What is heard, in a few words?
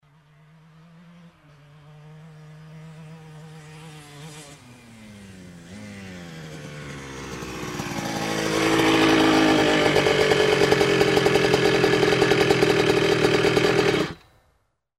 Motorcycle approaching, turning off